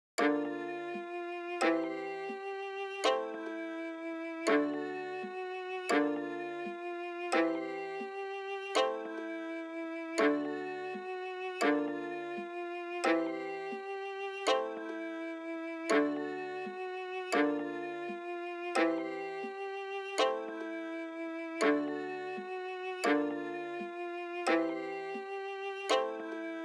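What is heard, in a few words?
Violin Strings Melody 84BPM D minor Melodic Dirty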